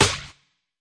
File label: fx drum